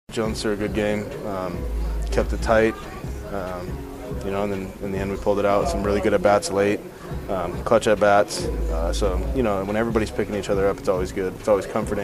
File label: rowdy yay us; reynolds tellez help pirates rebound to beat cards